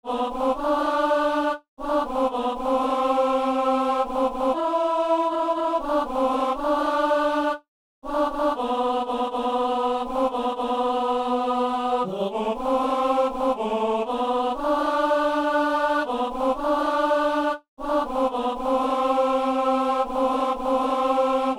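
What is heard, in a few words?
tenor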